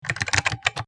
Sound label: keyboard